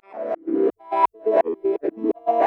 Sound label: Clav Reversed; Logic Fun; Heyhoo